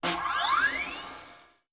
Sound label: Fast Whine Up Cartoon, servos